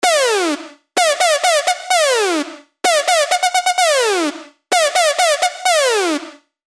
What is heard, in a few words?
Party Jumpin', dirty dutch leads; Spire; revealed spire dirty dutch leads vol 1